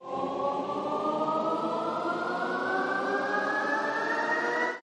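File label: respin sound; longpao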